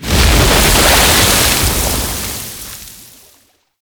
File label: Sealab Wave Crash Large; Warframe; The New War